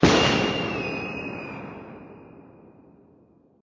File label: Rocket blow